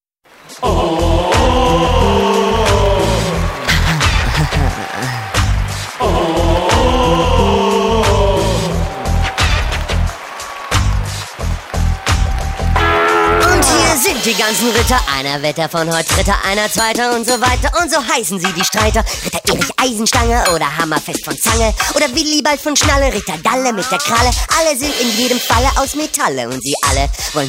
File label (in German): Rap